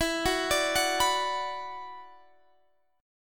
E 7th Suspended 2nd E down Guitar Standard; E7sus2; arpeggiated

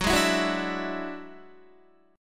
Gb 7th Suspended 2nd Sharp 5th, Guitar; strummed; JGuitar

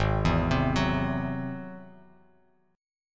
G sharp Augmented 7th G sharp down Bass Standard; G#+7 arpeggiated; JGuitar

